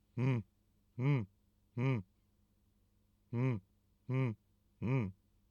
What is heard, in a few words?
inspiration chest voice